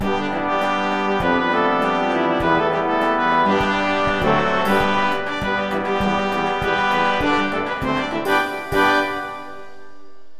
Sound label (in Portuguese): vinheta; Portal Brasil Sonoro; bom dia ceara